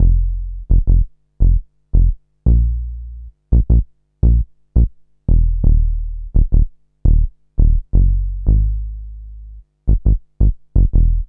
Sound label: Rhodes Bass, Drum & Bass; Fluid Dynamics